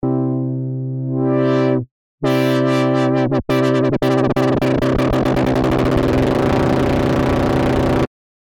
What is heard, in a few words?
lfo rate